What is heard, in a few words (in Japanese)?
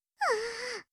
muu, breath